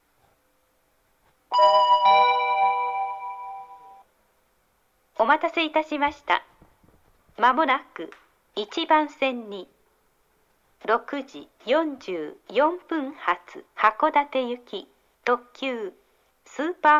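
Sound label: limited express, train station; shin-sapporo; super-hokuto2; hakodate; jr-hokkaido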